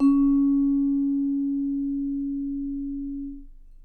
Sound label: C#4, Percussion & Harp; Miroslav Vitous